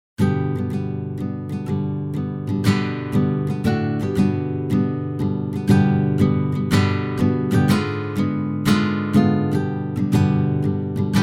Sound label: Chords Nylon Fng Strumming Voicing